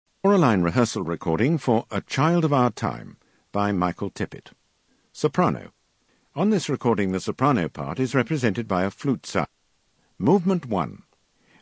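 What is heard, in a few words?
Soprano; Tippett A Child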